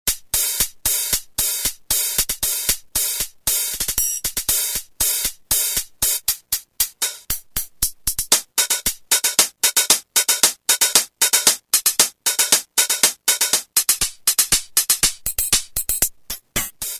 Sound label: Hi Hat; Roland M-DC1